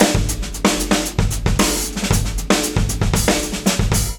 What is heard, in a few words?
DRUM 115